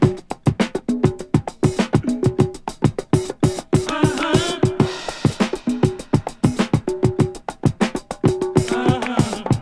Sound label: 133 bpm breakbeat sample f sharp key